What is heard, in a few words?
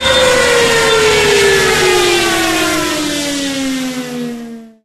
stuka siren end; War Thunder